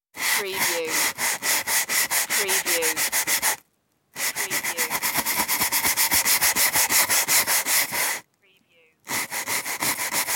brush scrub

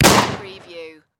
Shotgun Winchester 1897; sound effects bible

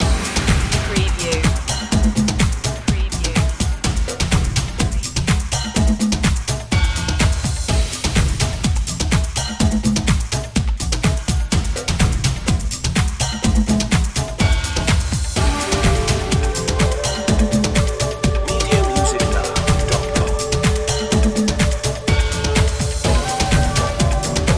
eastern adventure

